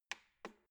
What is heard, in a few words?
embedded button press